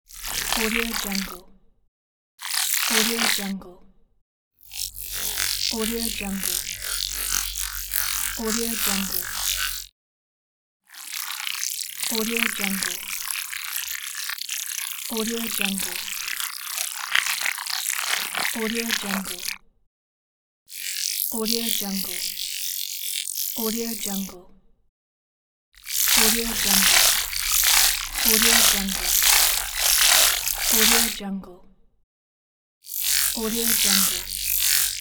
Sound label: high tech cybernetic motion elements